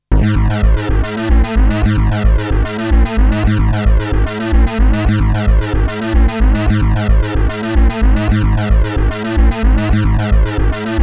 Radioactive Whistle; Forsaken les remixes officiels

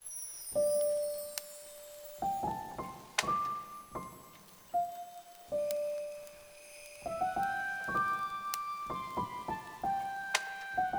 Snow Orchestral Cover; Pokemon Holiday Megamix 2018; Route 22; B2W2

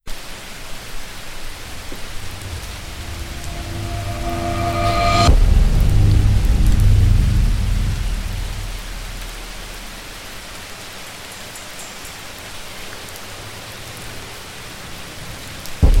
Damaged Slow with rain; Chasing Dead; Wii U

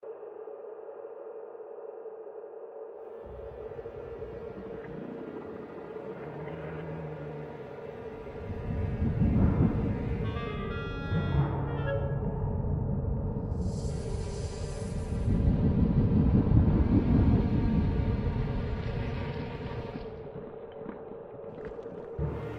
Dark Corridors Instrumental Ambience

